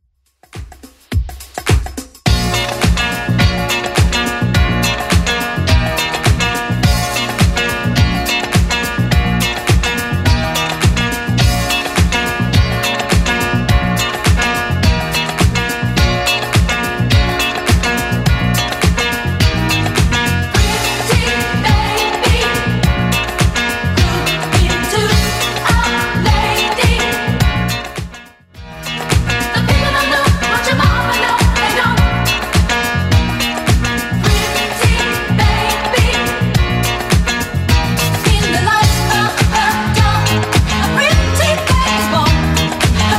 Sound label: 80s Funk 105 bpm CLEAN